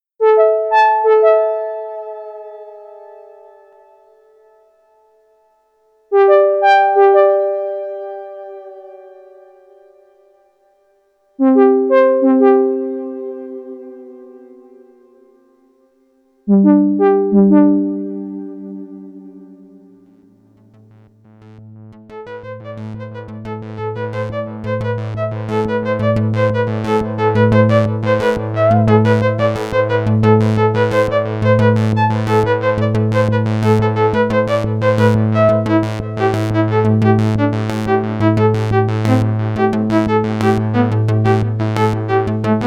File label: Sequential Prophet5 SAWTOOTH